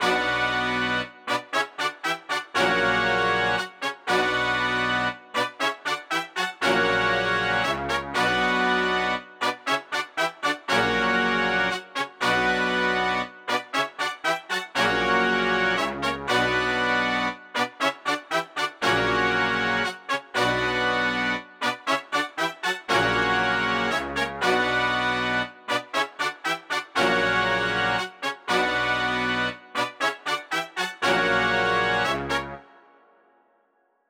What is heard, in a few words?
118 BPM B Min Horns; Melody; Trap; Loop Stems; Cymatics; 2022 Melody Collection; Trap Pt 1